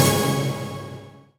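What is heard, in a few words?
synthesizer Dance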